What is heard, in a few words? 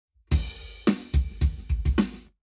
Lab Wet Drums 0deg; eMagLS